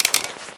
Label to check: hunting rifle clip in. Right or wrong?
Right